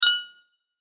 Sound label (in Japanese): グラス乾杯